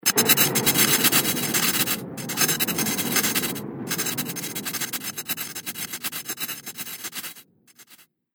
Impact Virus Attack, impact / explosion sound effect; Sci Fi Sound Effects; Andrew Fleming